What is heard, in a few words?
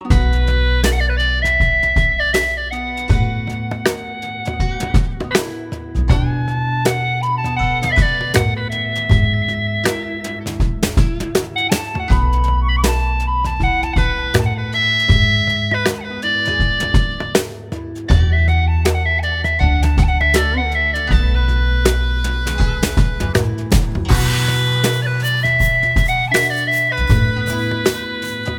Hulusi, Chinese Hulusi; Efimov Audio